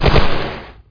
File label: fling